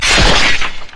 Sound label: basic attack; Yasuo